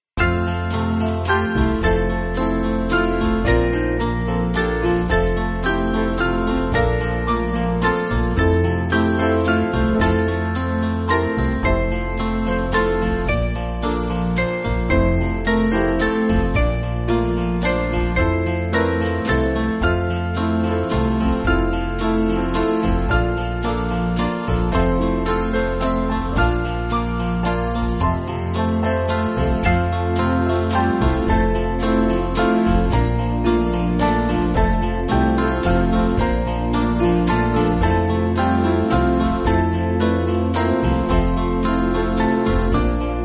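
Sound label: Band, Music Backing; God Almighty; England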